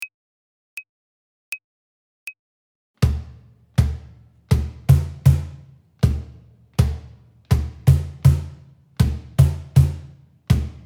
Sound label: Samba Intro